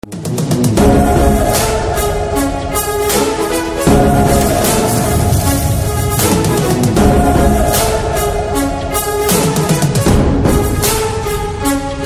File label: First Roar BGM Tone; NBK